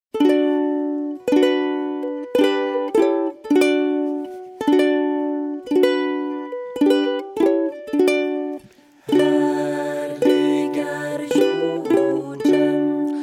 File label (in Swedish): Härlig är jorden m ukulele